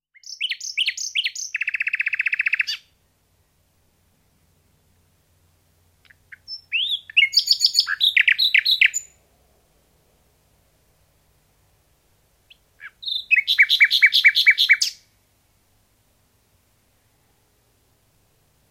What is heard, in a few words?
Nightingale